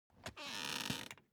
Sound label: object chair office creak lean back short